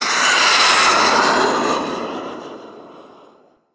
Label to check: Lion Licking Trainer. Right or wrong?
Wrong